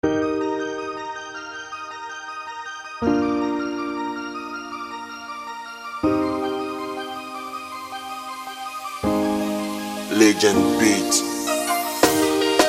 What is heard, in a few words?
AFRO Hip Hop Cypher Beat; BlowNaija